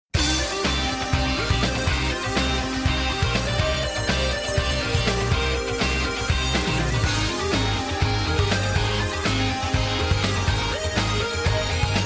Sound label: instrumental; Riding the Tide